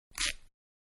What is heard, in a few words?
Cloth Being Torn Short; Ripping; Sound Effects:Objects; Manhattan Production Music